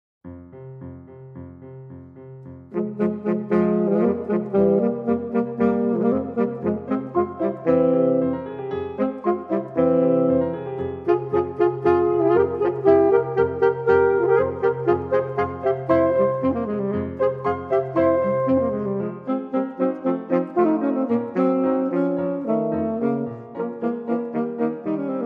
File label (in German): alt tenorsaxophon klavier; hofmeister verlag